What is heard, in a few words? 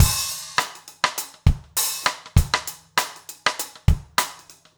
basic funk 100 rims